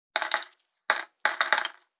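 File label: Coins Multiple Toss Bowl; SFXSource; Object & Material Sounds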